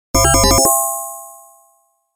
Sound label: coin